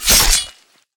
slash, melee; civ13; civ14; Weapons

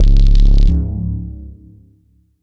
rad pulse; civ13; civ14

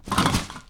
trash bag

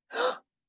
man gasp

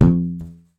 thunk

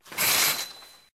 curtain open close

